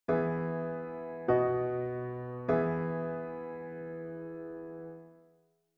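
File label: E major PAC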